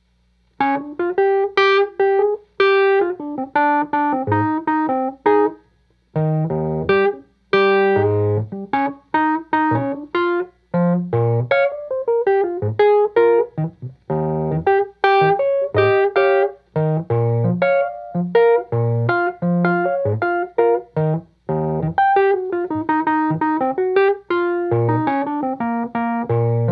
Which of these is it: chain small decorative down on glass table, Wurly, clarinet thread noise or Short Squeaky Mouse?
Wurly